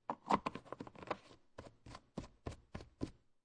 Household, Laundry Detergent Bottle, Plastic Cap Screw On